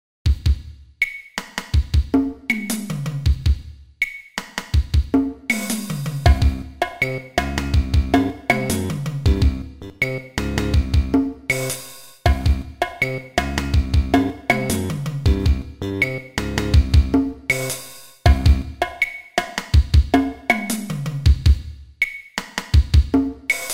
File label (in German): latinrhythmus